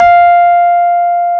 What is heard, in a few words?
F 4, Electric Pianos; Wizoo